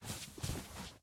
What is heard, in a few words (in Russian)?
shurshashij otdalyonnij zvuk vistrela salyuta